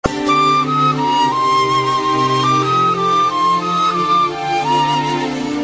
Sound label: melody